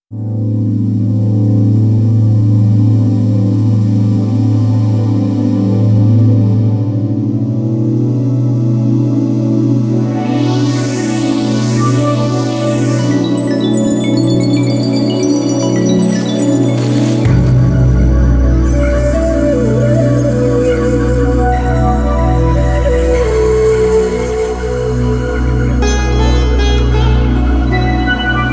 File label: World Synth